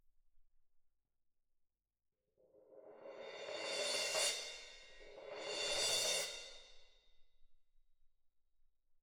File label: Perc